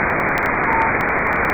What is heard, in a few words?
Ping meteor scatter